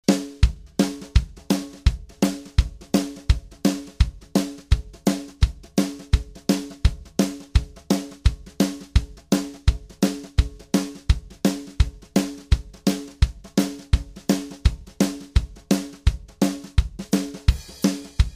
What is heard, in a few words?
Brush example